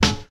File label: loud steel snare drum sound C sharp key